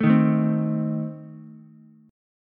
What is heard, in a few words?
A Diminished A 2,3,5,3 down Ukulele; Adim strummed; JGuitar